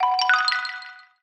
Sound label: Lit, notifications; Honor Pad X7